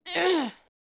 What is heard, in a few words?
push; poolofradiance